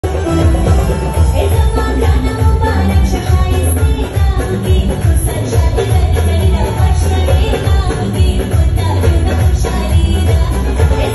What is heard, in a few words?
Singing